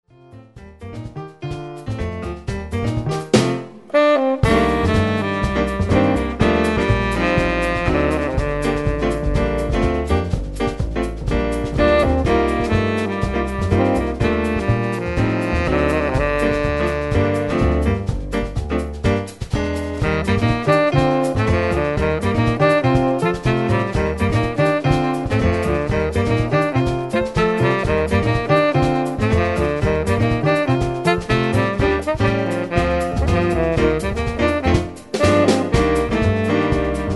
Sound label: Samba